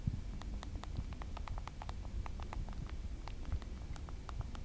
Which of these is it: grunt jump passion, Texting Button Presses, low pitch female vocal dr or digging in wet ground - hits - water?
Texting Button Presses